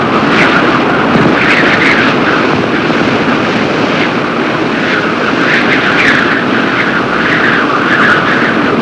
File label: whipping wind; Sound Effects for Multimedia - Volume 1